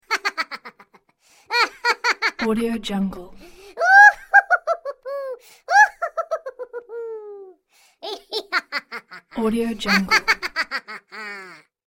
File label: cartoon laughing